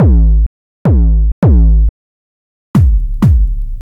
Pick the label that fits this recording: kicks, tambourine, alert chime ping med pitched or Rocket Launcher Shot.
kicks